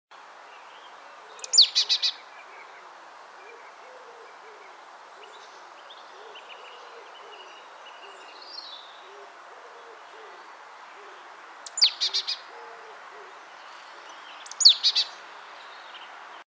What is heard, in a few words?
Poecile palustris; SETE